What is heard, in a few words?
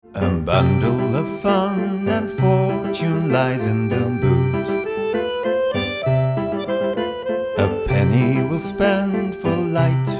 accordion